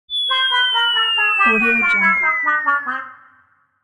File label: cartoon whistle muted trumpet falling; سوت کارتونی با شیپور; game sounds